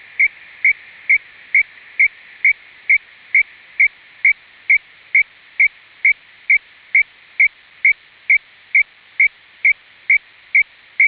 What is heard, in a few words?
SITOR-A IRS; SITOR-A in IRS mode; FSK-systems